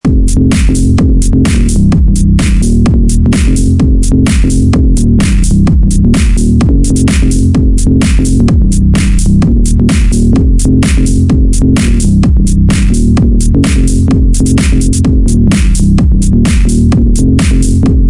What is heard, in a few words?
club music loop